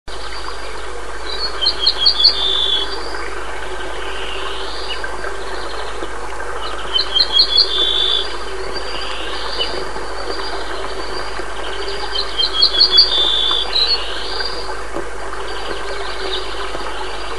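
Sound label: ortolan bunting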